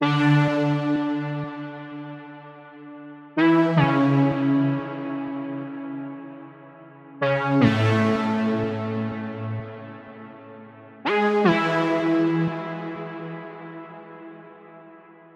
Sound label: Serum Lead